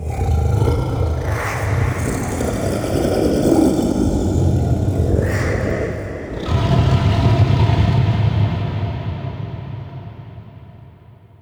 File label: Brain Grunt One Off; Warframe; Infested Eris; Inf Flesh Scape